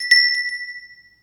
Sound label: Bell